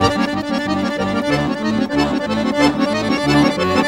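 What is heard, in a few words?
accordion groove; Best Service; World Colours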